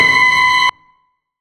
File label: Scary String; Zero-G Sound Sense Grime Scene; 90 s Sample CDs